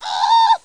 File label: Foiled; Bible Fun Pack; NOAH